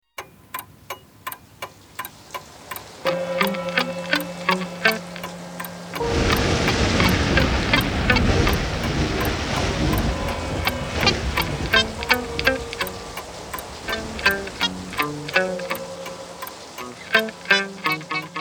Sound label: Intro Male